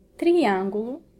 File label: triangle